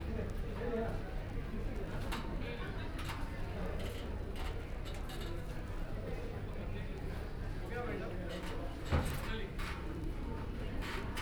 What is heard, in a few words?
Kathmandu Airport, fieldrecording; Boudha, Kathmandu, Nepal